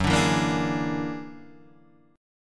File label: F sharp Augmented 9th F sharp Guitar Half Step Down